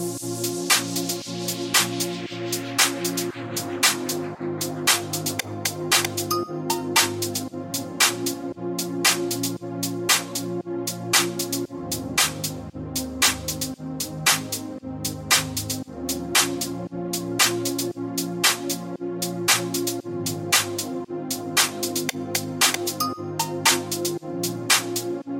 Love Music English Ringtone

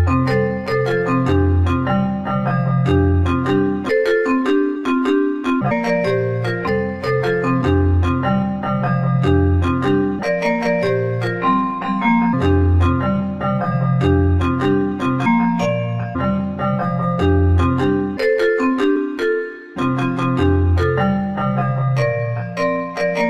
Moto Ringtone

